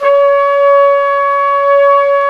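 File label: WND, Hybrid Winds, Flute & Flugel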